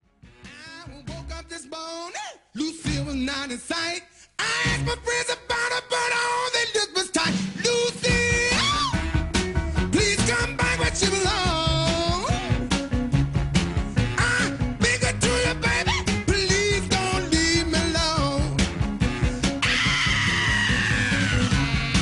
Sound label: Rock Roll